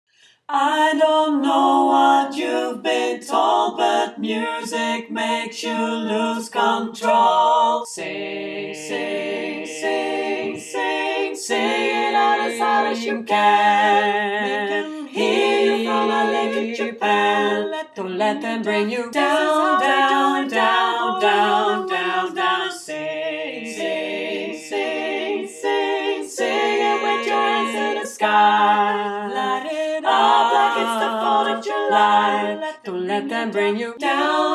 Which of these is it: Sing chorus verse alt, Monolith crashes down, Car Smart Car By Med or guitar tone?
Sing chorus verse alt